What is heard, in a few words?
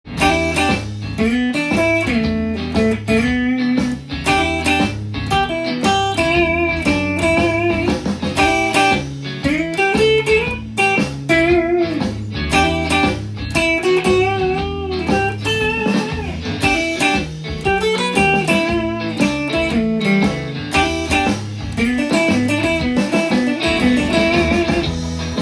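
e blues lick solo, guitar; collection of short e blues phrases tab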